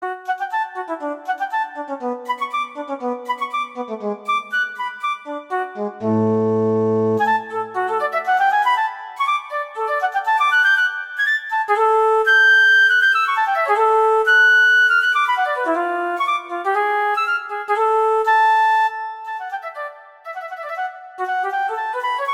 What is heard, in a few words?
Allegro - Extra Cadenza; Mozart; Concerto K314